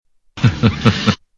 hahahaha; freak fortress 2; chuck norris

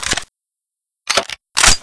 sawed off reload, weapons

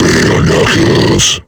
alert; kfsmr flesh clown

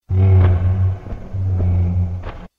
teleport; springtrap

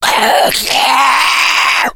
death, slender shiver

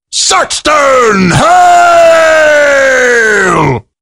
rage; saxton hale

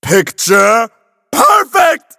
grooves positive; tf; a hat in time

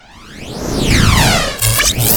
teleport; freak fortress 2

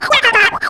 cheers; isabelle

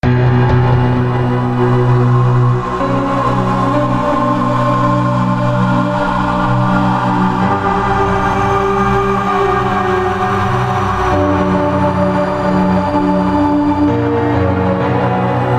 music default intro

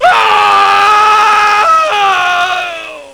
scream; tf; slender; underhell

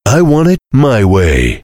heavy singing; tf; duke

